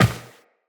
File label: Step Glass, Footsteps, Walk; Characters; Tenno